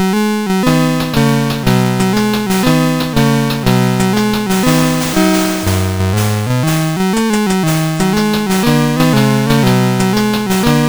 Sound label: Demo, CSG8580R5; sid